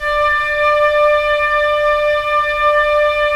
Flute, Roland LA Composer; Roland - LA Composer vol. 4; 90 s Sample CDs

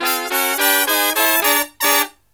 Brass Riffs

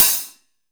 MIX LHH, 14INCH OLD HI HAT

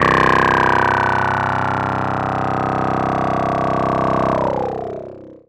synth, Synth Atmos (pad); ambient; Chillout

